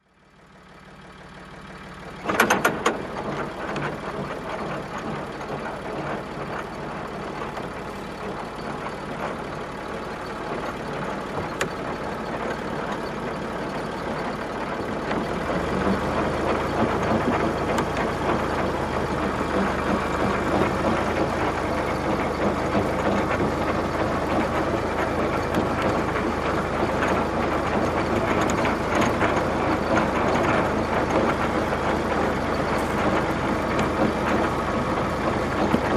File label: Old engine running